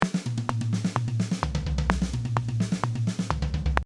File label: Fill